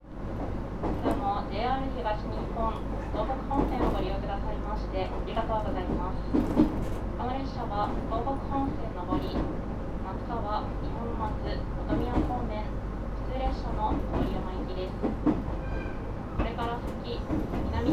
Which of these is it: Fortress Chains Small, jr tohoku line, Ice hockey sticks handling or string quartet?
jr tohoku line